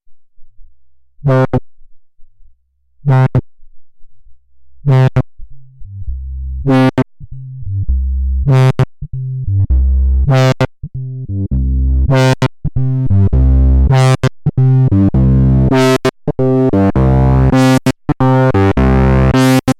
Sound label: OSC 1 2 3 FET Filtersequence